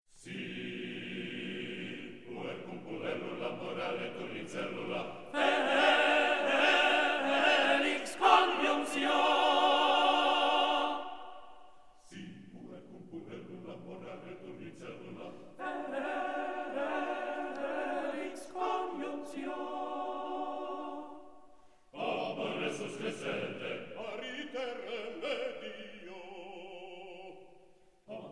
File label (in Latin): Si puer cum puellula Tenor up to tempo